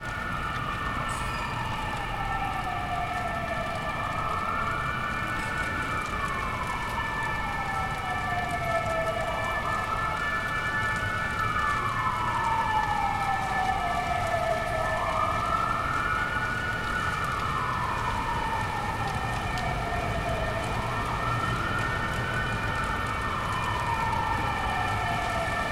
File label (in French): Paris Pluie Sirènes